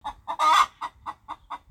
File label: chicken death; HAK-Casino; HAK-Chicken